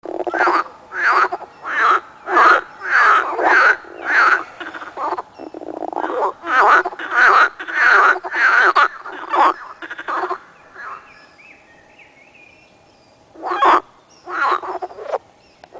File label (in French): Cri grenouille